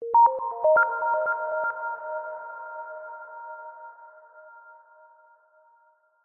multimedia musical logo science medical high pitched delayed beeps; zapsplat